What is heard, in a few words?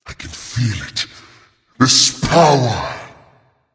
transformation; smite; voicepack; thanatos